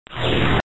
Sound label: blast; Pro Android Games; SpaceBlaster